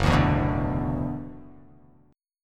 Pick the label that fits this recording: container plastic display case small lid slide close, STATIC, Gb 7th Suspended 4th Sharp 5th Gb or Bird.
Gb 7th Suspended 4th Sharp 5th Gb